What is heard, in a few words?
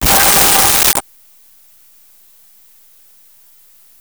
Wrong answer; russia; Rosegarden